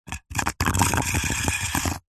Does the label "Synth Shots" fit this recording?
No